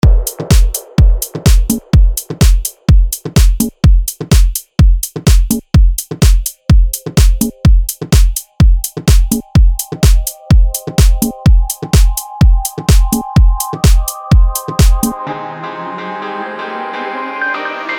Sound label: house